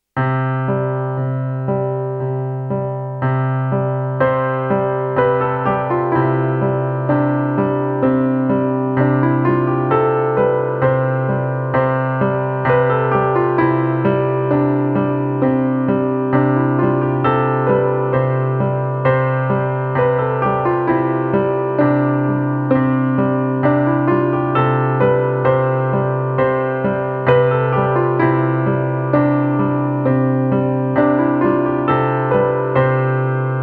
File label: solo piano